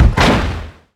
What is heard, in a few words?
damage hits explosions, Warships